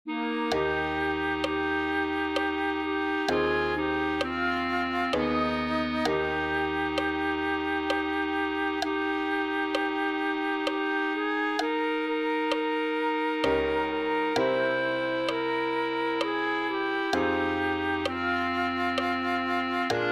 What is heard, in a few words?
clarinet